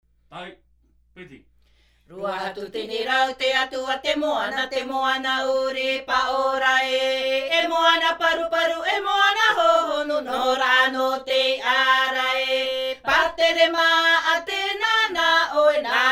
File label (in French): Himene tarava Raromatai, chants polyphoniques maohi